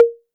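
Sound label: Roland808 high conga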